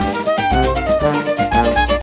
mus cage forcefield; jungle world

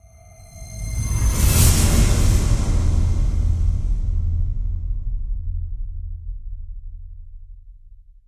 Swell

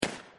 arc turret sound; Arc of Templar

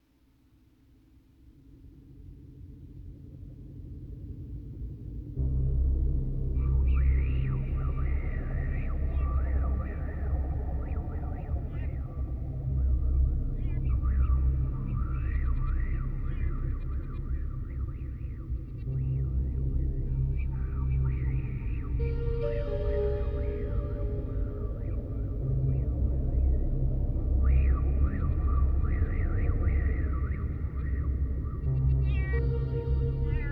Fungus World ambience; Lode Runner The Legend Returns

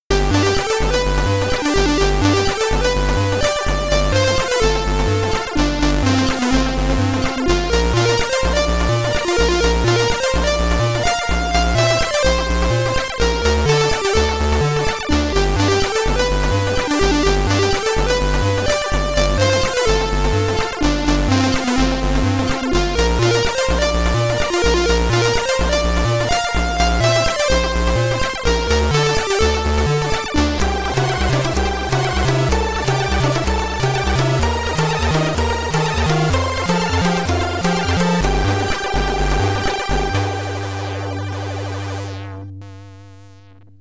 SID Stereo; Commodore 64

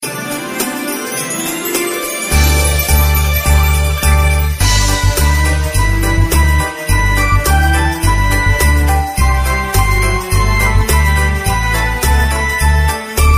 Jingle Bells; Super Rare Tracks

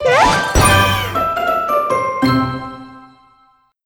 Crunchy Finish

